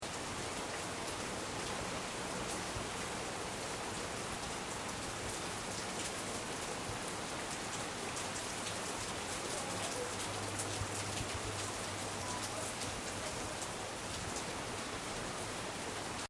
Florida Keys Light Rain Loop, Quiet, light, refreshing rain; Seamlessly looping sound